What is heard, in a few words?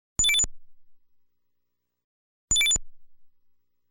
Lucky Bell Sound; Kaijuu Densetsu The Tale of the Shell Monsters